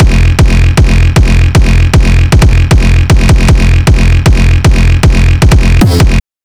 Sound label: kicks loop 155; GhostHack